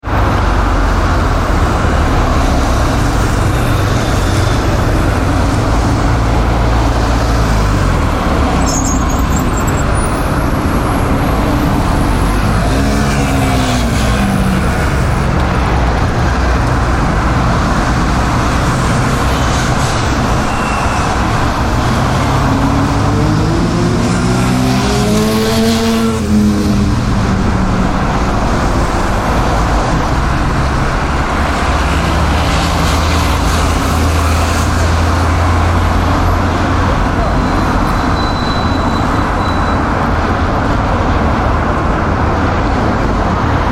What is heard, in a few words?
Very heavy traffic in London; City Traffic; Traffic and Cars (Sound Effects:Backgrounds)